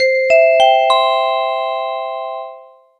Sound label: vibraphone